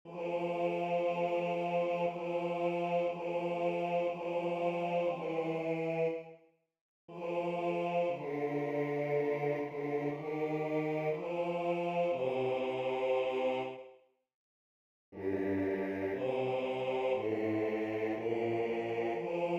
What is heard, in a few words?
Basso; repertorio polifonico